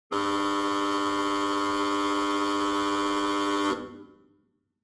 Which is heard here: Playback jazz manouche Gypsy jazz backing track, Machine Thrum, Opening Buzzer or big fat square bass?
Opening Buzzer